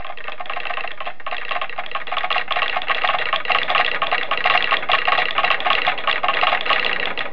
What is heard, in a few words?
electronics; horn